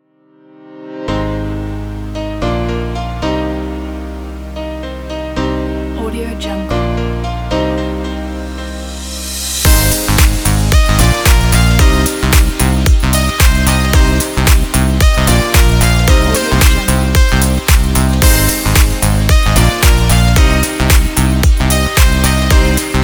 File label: positive dance